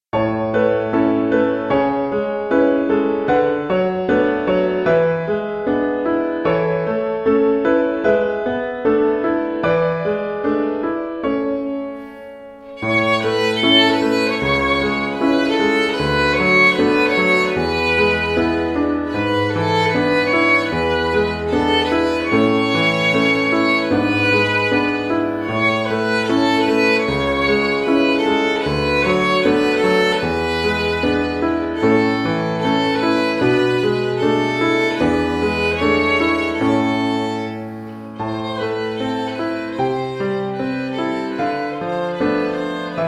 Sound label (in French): Violon et Piano